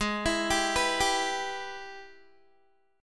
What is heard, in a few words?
Ab Minor Major 7th Sharp 5th, down, Guitar; arpeggiated; AbmM7#5